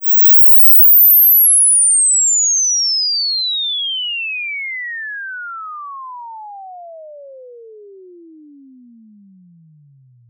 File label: Inverse Sweep, Test Signals